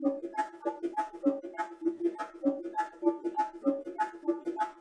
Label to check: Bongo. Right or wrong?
Right